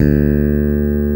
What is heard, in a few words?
5 STR P BS D3, PICK BASS